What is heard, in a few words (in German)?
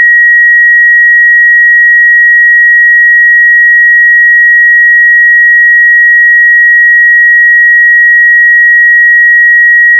sinus 1900hz